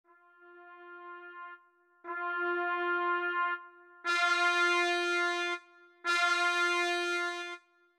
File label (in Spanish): volumen